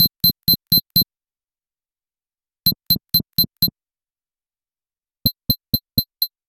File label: snaps, ringtones; Nothing Phone (1)